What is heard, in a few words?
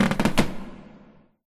plank creak; scripted sequences